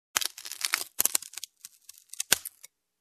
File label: Wooden Branch Crackles